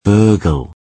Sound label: burgle